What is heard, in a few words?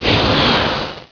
BOOT ROCK